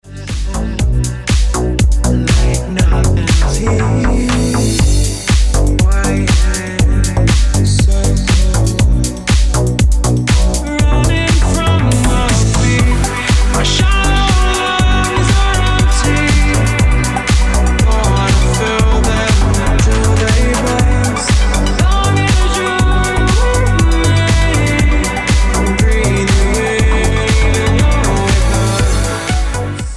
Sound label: Original Mix Ringtone